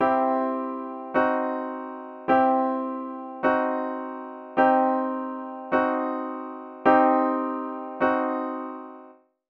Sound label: C major and minor vs. C diminished